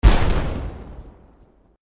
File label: cannon